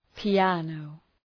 piano

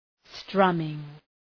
strumming

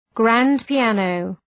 grand piano

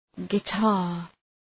guitar

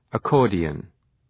accordion

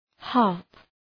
harp

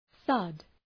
thud

